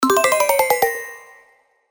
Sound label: lose